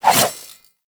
Nikana Spin Swing B; Tenno; Revenant

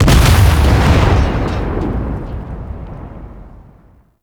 Arachnoid Camper Death Final Explosion; Warframe; Corpus

